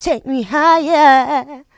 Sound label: voc, female vox, Vocal House